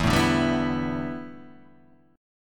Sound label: A 9th Suspended 4th E